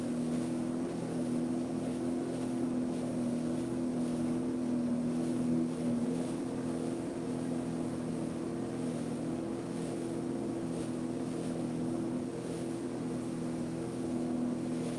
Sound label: jet ski pwc Sea-Doo driving steady on board loop 5000rpm driver perspective; sonniss gdc